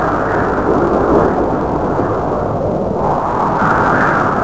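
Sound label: wind light; The Elder Scrolls III Morrowind GOTY; XBOX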